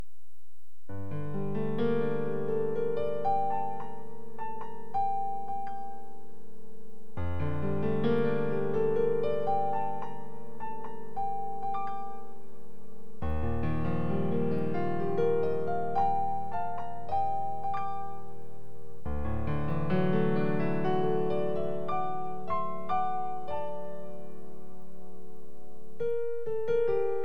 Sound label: Ukrainian Bell Carol